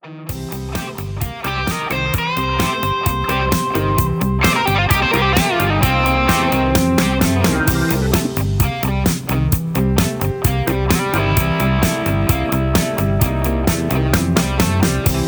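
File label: Instrumental with background vocals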